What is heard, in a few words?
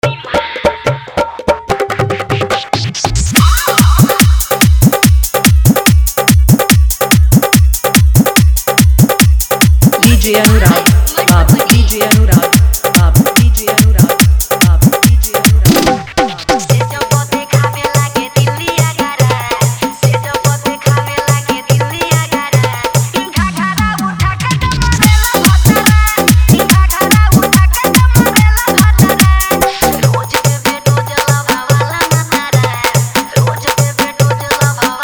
Bhojpuri Hard Dhollki Bass Mix